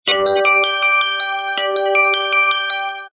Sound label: Rich Tone; Samsung SGH-A187